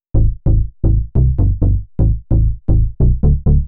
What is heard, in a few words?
Bass, uk garage, 130bpm; Zona